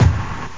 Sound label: Bonk